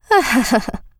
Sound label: laugh; Eternal Return; Katja; ENG